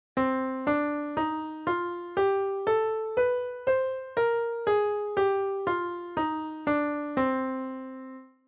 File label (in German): Tonleiter C Moll Mel; Weitere Skalen; Tonarten